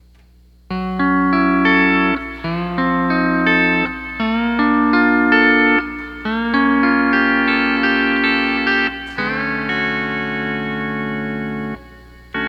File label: Night Life E9th